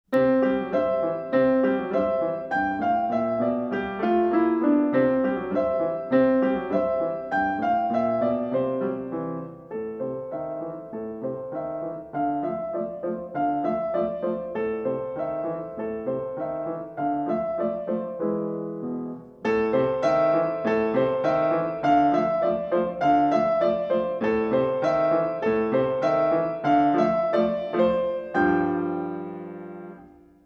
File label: Bananappeal piano